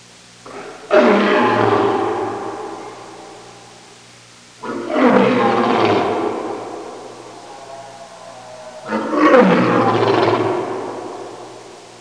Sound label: Mountain lion roar